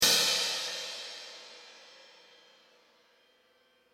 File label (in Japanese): シャーン